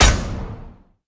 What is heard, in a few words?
auto hit; smite; Apollo